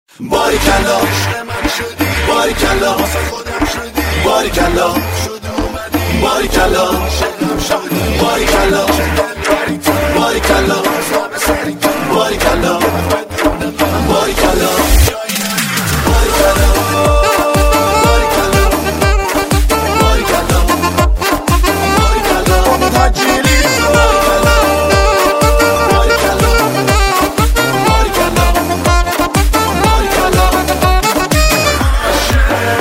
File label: Karaoke